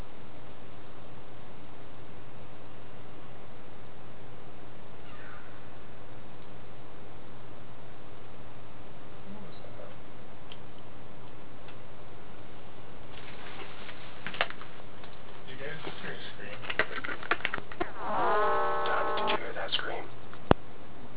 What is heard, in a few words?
Strange Scream